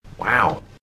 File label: bouncy creeper boing; skyfactory4